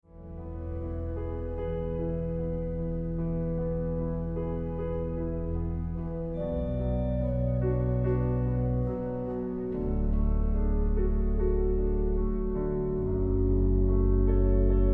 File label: Walking